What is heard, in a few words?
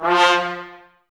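brass trumpets F; Miroslav Vitous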